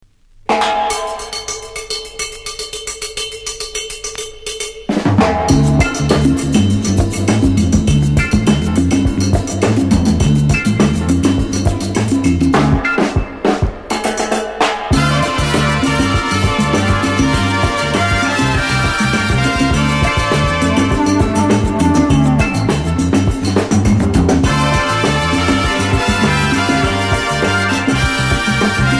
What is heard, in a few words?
LATIN SOUND